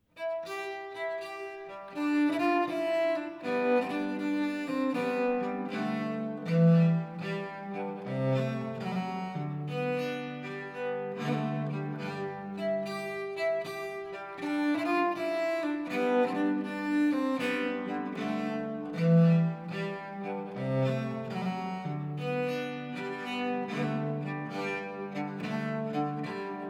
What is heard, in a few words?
jigg for lyra viol